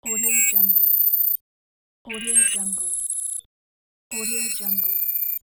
futuristic sound; andrewsound83

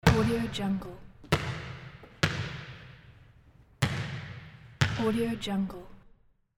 basketball bouncing in large gym

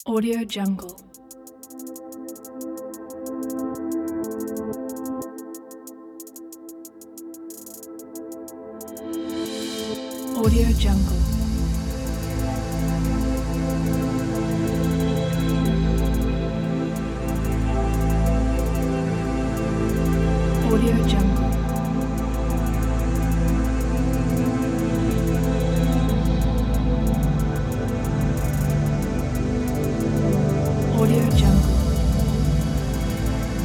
meditation ambient

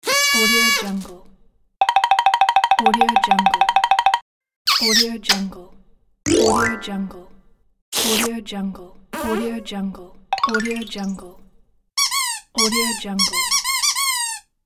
cartoon sound pack

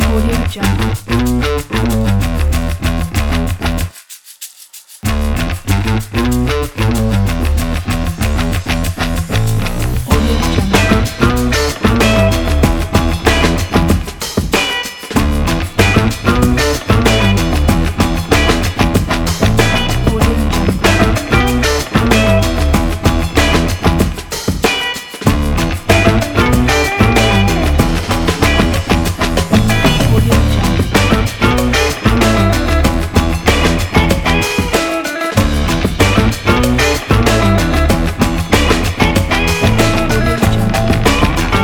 vintage retro funk

